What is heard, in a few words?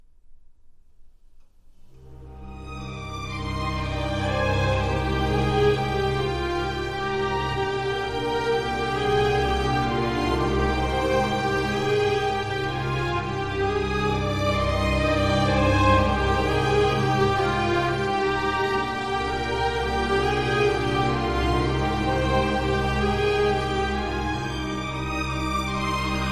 Only Strings